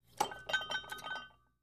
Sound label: Free Weights Exerci